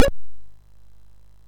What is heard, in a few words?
sid, MOS6581R2; Donkey Kong 1983